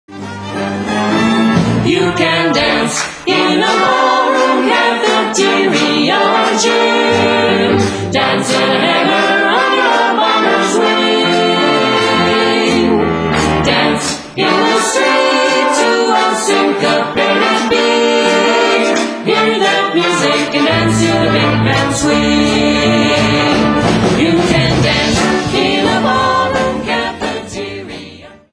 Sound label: No Rhythm